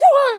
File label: Attacked; Neru; SM64 CO-OP; Triple Baka Pack